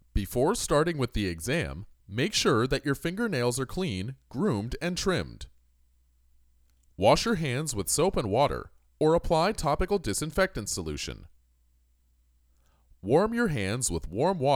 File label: Percussion